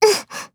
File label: combo break; osu